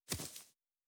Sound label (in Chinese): 门牌互动音, UI